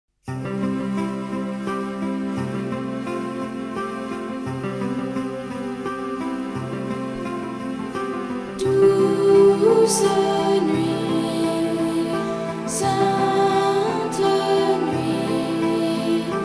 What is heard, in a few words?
Douce Nuit (Sung French); Focus Music